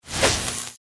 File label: Powergrid Whoosh Top; Bouncy Balls 2